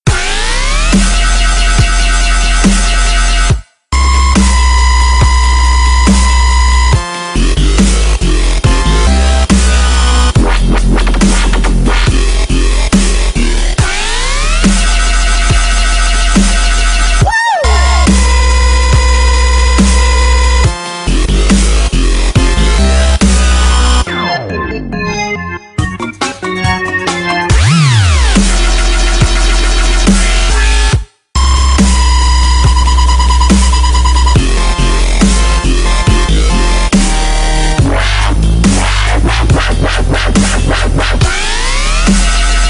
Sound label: Drop, wub wub wub; TF2Maps